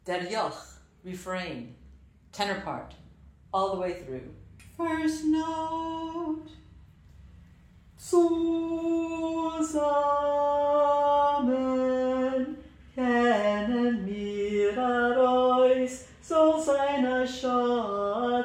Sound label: refrain tenor; all the way through; Der Yokh